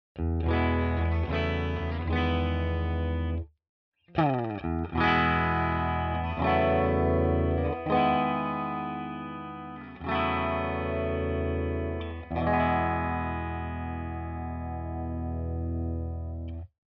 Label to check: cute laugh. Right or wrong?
Wrong